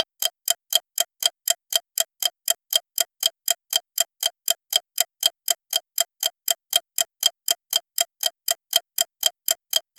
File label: clock tik tik sound of; timer